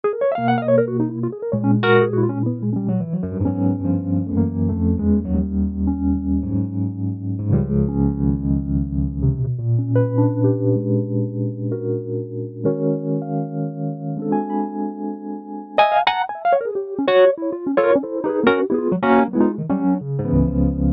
Wurlitzer

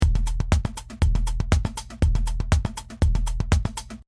Electro 120

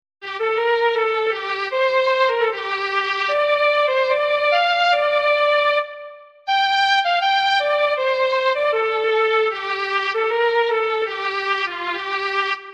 Tron String Flute

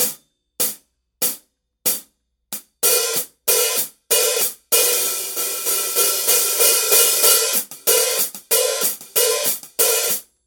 hi-hat pattern

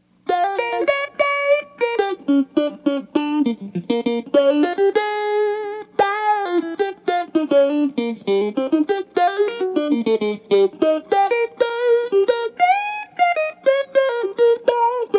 Blues Wah Rocker Solo

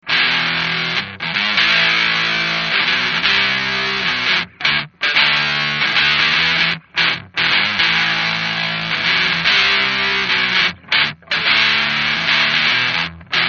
buzzsaw fuzz

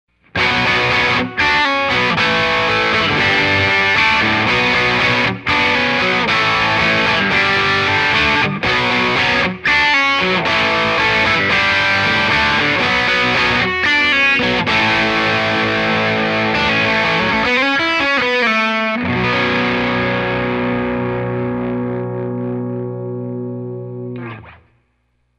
Dirt Tele

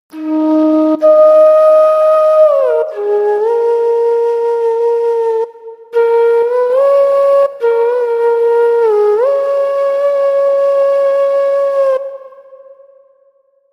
Indian Flute Stretch

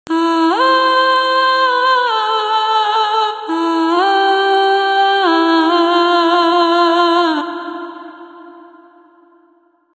Solo Vocal Stretch

